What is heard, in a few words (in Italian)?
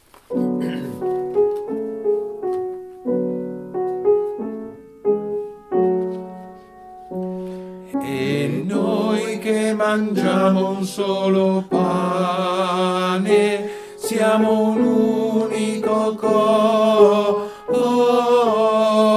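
Eucarestia, tenori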